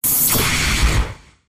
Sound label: magic electron